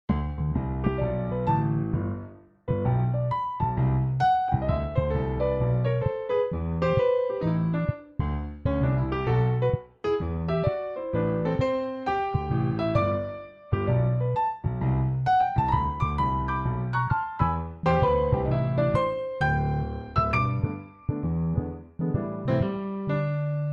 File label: The Voyage jazz piano; Samsung Galaxy S23; ringtones